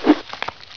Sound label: hand attack; the hand; hunger